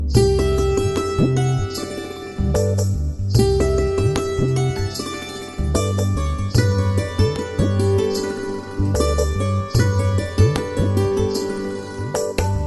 sweet nature

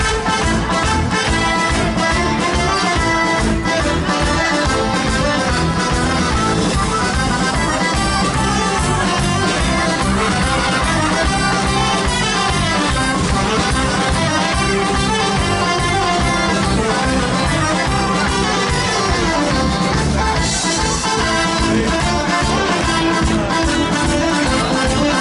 tarantella wedding

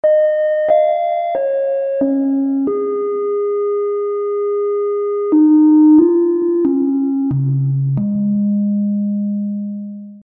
tone

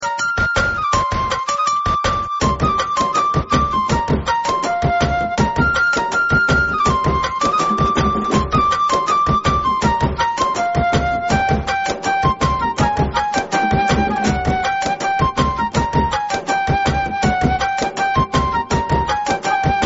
flute

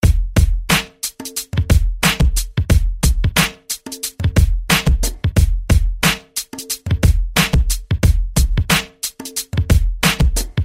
drums music, jazz

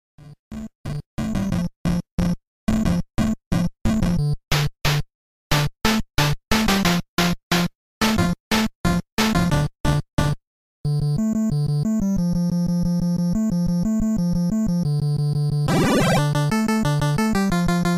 8bit, ringtone

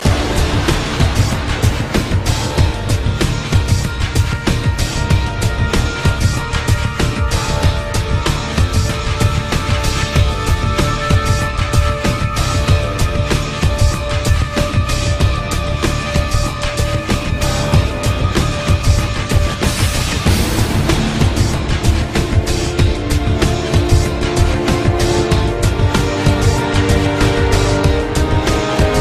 menu; mw2 spec ops

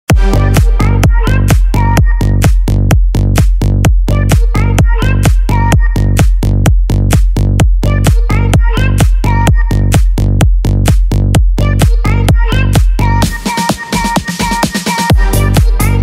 Bass House

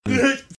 Is Calling Rap, ringtones

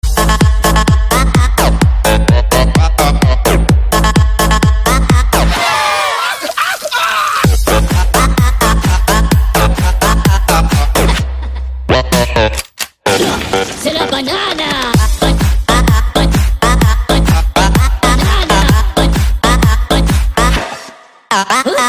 electro mix; minion

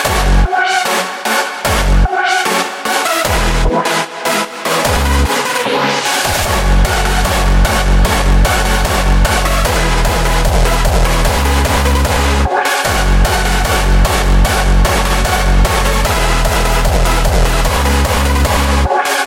Euphoric Hardstyle

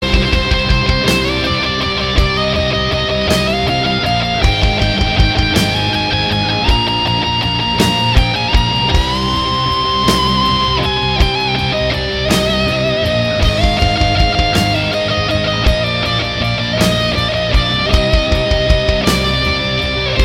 Anthem Solo

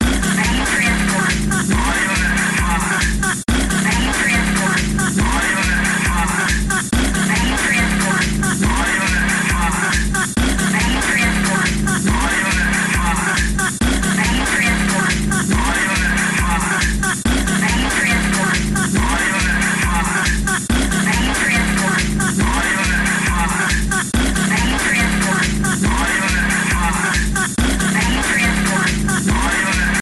Drive standby Loop

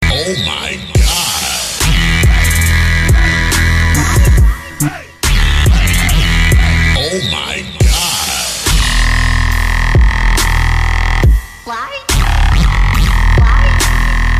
Electro

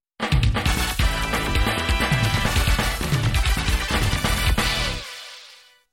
battle start

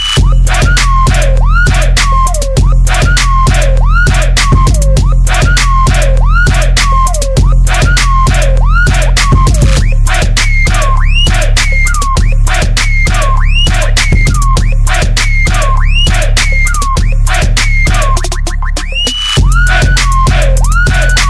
Trap Music Yeah

